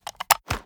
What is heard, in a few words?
sks reload xmag end; Tacz1.1.5+; RSWS 1903